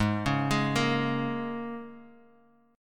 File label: Bb Minor 7th Ab